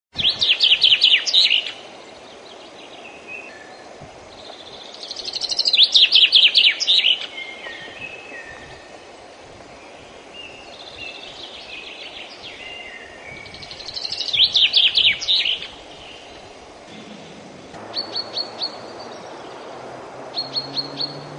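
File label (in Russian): zjablik, common chaffinch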